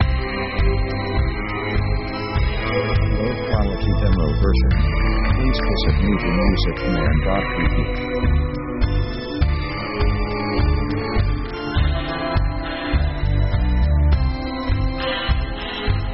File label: pop cheap tango